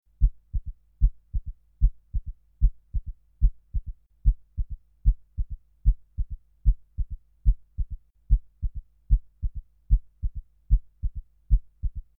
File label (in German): Lauter 3 Herzton; Heartbeat